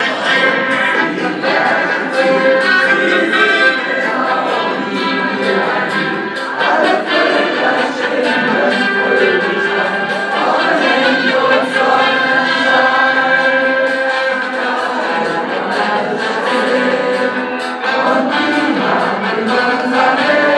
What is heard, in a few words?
social singing